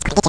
OOO

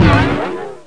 LAUNCH; thexder

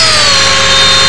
TBLSAW; REDNECK; RAMPAGER

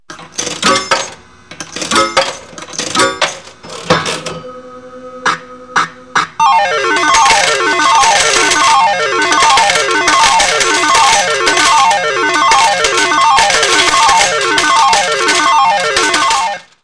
Slot Machine with Payout; Casino; 16-bit; KABOOM! - Wild and Wacky Sound Software; 1,000 Sounds

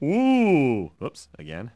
ooh whoop; Wired for Sound Pro CD; Aristosoft, Inc.

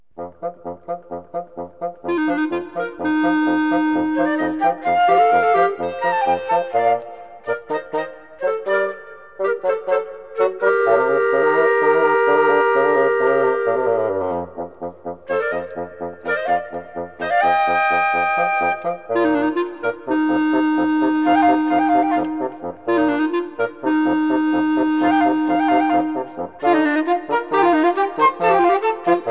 FLUTE CLA

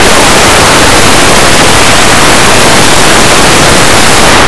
paper

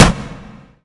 bang; RallyC; CAR

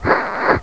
kiss; thexder

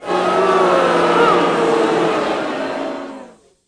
BOOF, petitioners

